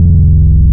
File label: VV Diode Sync, wavetables; Animoog